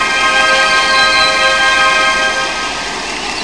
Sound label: Tingle Sound